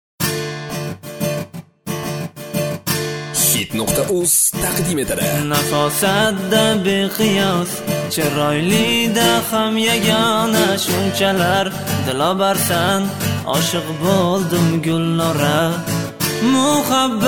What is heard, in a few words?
Guitar